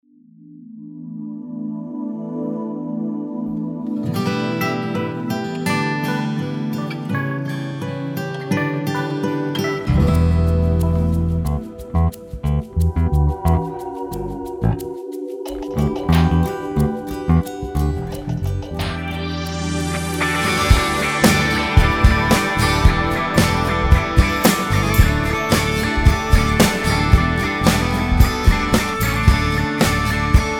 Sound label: Acoustic Pop